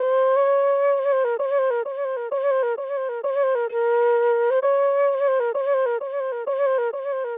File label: Sudden Flute Loop BPM 130 A; Bihu; waves of bihu vol 2; Gully Loops